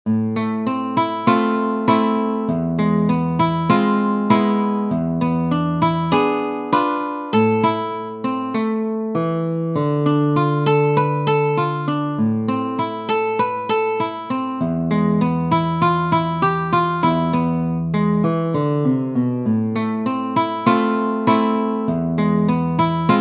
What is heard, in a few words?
Tango, guitar